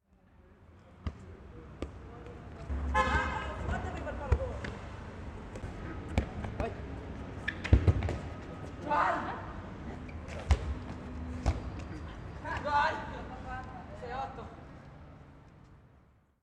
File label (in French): Partie De Foot